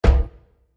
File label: Short Impact; Miscellaneous Impact; Impacts; CraftedSignals